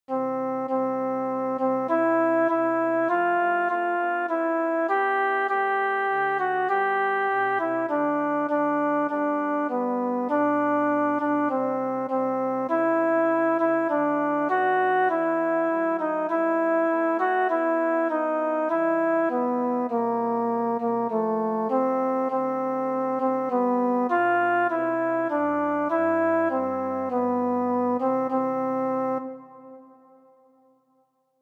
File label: alto